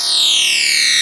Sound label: Stretchy C3; Club Techno